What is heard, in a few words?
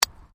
Digging Small Handheld Tool Rocks